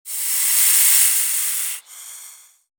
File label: Snake aggressive hiss